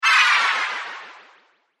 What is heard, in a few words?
Rpg Spell Mana Drain, Magic Sound Effects